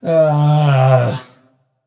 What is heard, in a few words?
nv sigh; smite; xbalanque